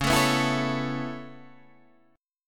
Db Minor 7th Sharp 5th Db down Guitar Half Step Down